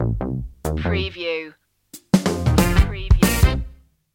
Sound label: funky jazzy stinger